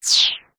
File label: Breathy down